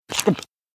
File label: chomp; Bananaman - Chase in Space